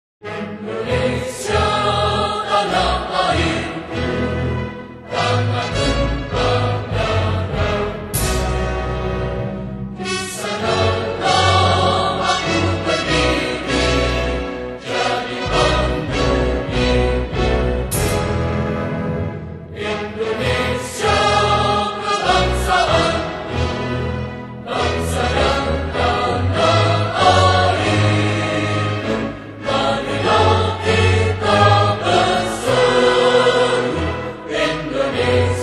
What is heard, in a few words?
Indonesia National Anthem; SEAMEO